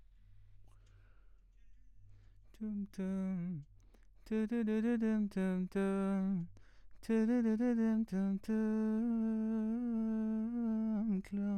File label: VOX